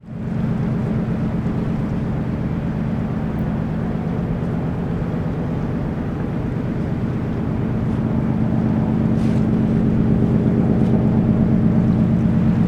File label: ambience port ferries idle background waves lap on rocks near; dover uk